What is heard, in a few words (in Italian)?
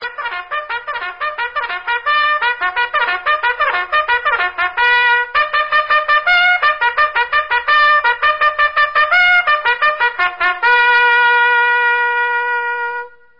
cavalleria, tono di notifica; per Cellulari Android o Iphone; Cavalleria Ver. 3